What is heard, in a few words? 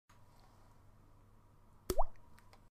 water drop sound effect